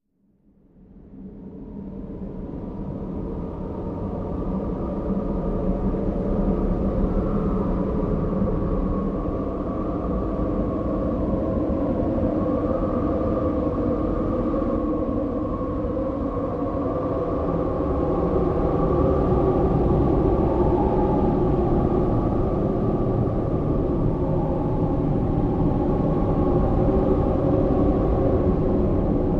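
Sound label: Spirit Wind Desolate, ghosts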